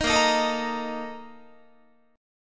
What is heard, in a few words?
Bb Major 7th Suspended 4th, Guitar; BbM7sus4 strummed